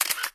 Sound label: screenshot